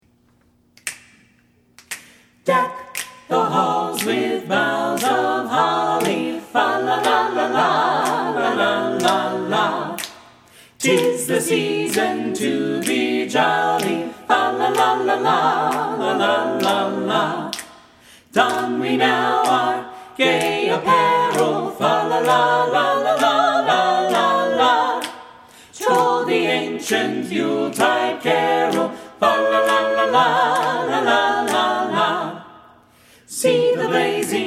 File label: Carolers Deck the Halls; christmas